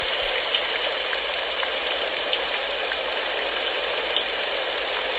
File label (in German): Regen